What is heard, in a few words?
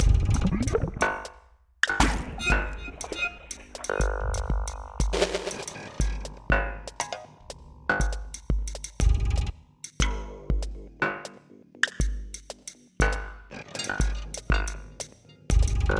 swamp; Secrets of Raetikon